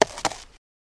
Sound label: Horse Hoof Step On Gra